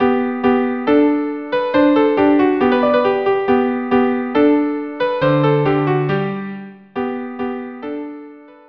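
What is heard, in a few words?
viola cello allegro; KV 487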